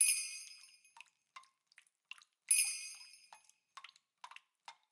鬼差出场; 序章

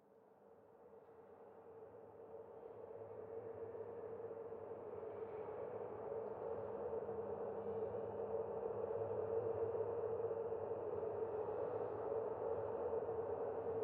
公寓楼道, 环境音

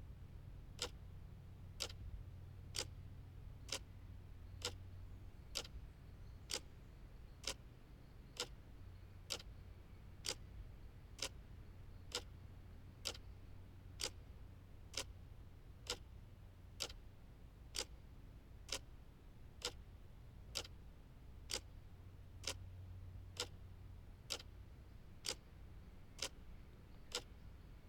院长房间, 环境音

